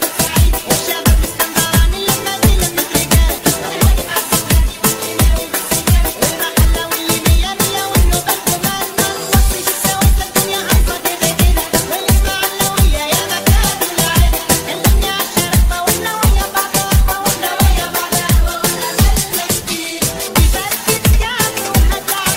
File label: arabic ringtone; islamic ringtones